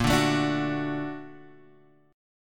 Bb Augmented Major 7th Bb Guitar Half Step Down, chord